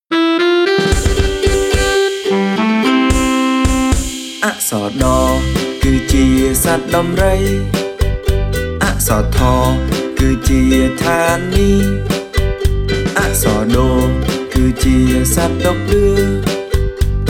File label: Khmer Alphabet Song; បទចម្រៀងព្យញ្ជនៈខ្មែរ; ដ-ណ